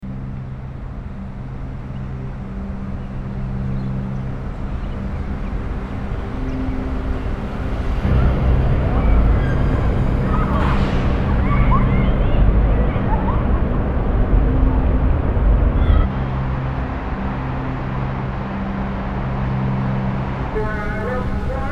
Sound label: Dubai Megapolis Sonic Bed